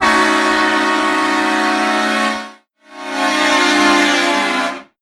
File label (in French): Son Klaxon Train 24V 4 trompes; Hornblaster Shocker XL